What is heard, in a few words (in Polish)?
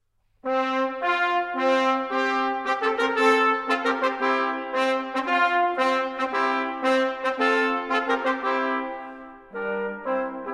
hejnal